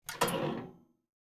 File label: oven open, kitchen